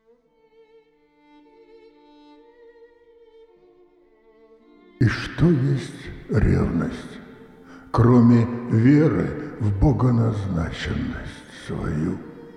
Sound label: sonata